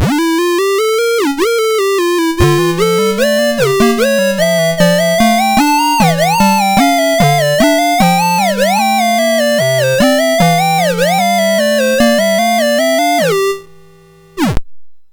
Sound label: Silly Musical Joke, MOS6581R2